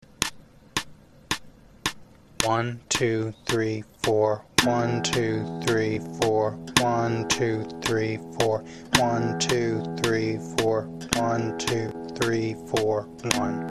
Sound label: example, guitar, And again, at 110 BPM